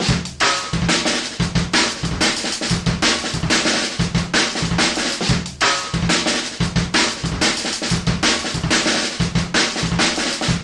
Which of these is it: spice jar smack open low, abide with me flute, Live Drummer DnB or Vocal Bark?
Live Drummer DnB